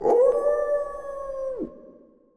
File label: wolf howling